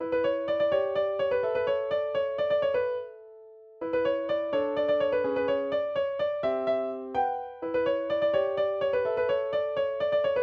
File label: instrumental